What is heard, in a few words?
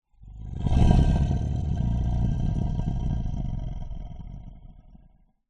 horror monster warning growl; zapsplat